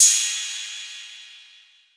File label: drum hit finish; osu!